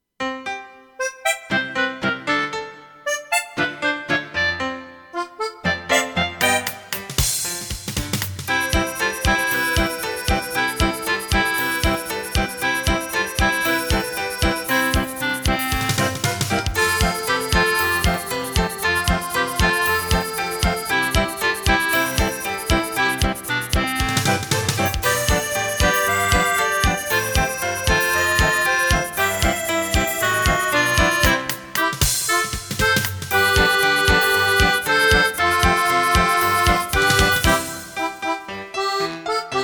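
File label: Ragtime for Beginners accordion piano clarinet or flute guitar drums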